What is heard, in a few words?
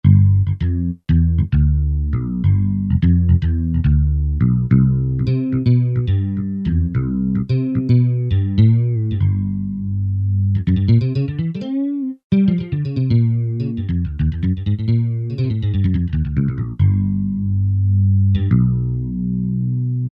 Bass, Kurzweil K2000; Kurzweil K2000 Contemporary ROM; Contemporary ROM Programs and Demos diskette